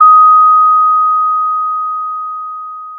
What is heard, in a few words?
Sound of a school bell